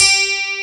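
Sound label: ACOUSTIC GUITAR; Pro-Rec - Synthsonic; BASSES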